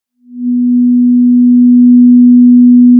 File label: Sound of a cruise ship horn